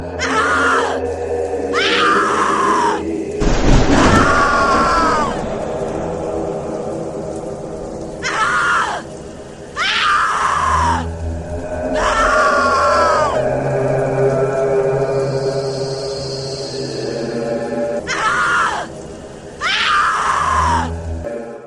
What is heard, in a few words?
Horror Scene